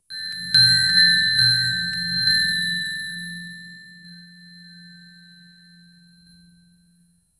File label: a very short soft melodic, music box